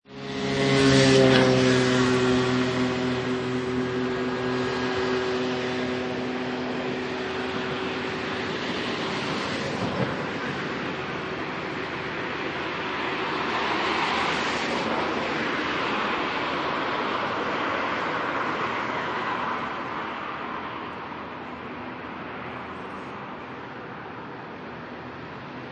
Night City Traffic, Medium to heavy traffic at night time; in European city; Modern cars driving by at medium speed; Lynne Music